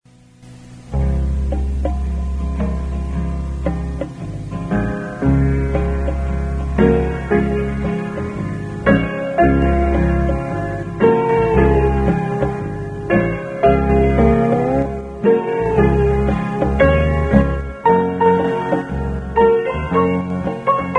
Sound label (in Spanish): instrumental; Mirad cuan bueno y delicioso